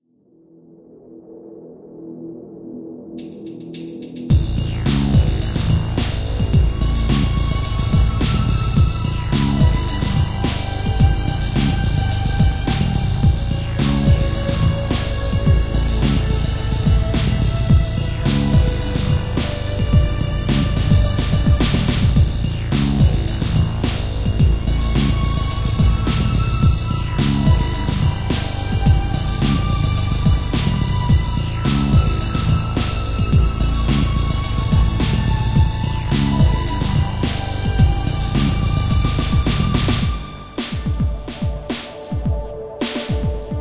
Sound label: level 1